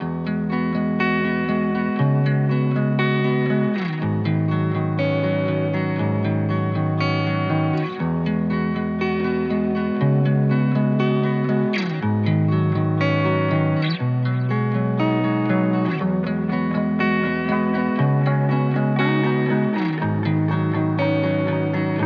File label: 120 BPM B Maj, Guitar; Tremble; Cymatics; Miami Guitar Pack - Pt 2